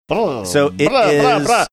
making series of funny sounds

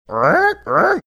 mimicking creaky floorboards

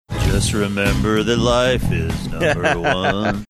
singing just remember that life is number one

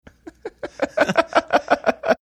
chuckling

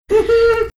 cute laugh

